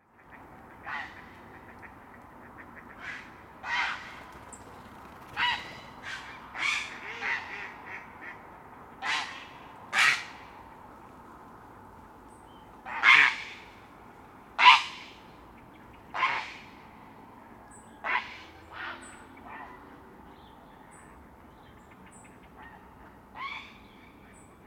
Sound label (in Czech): volavka popelava Ardea cinerea